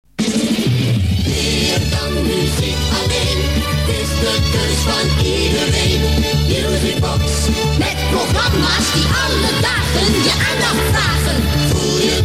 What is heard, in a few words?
musicbox; radiopiraten